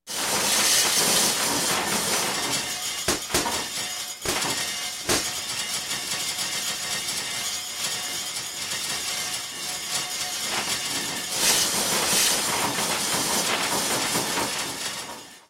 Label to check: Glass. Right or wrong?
Right